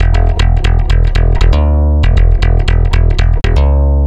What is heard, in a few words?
Stick Loop, Stick Bass